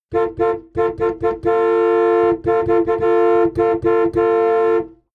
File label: car horn chopped interior hummer h3